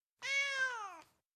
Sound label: ambient port default; World Of Warships